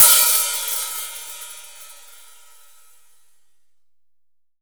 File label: HAT, percussion reverb; MARKYDRUMS